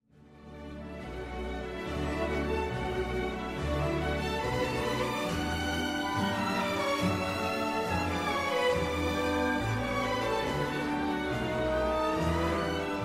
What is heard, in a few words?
Tune; Vlaanderen; Francis Durbridge